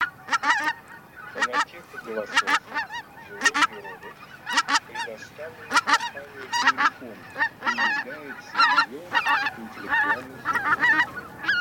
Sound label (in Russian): Белолобый Oca Lombardella; plurifon